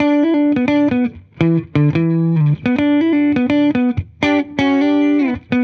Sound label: 70s Strat, Guitar, 85bpm, E, dusty funk; musicradar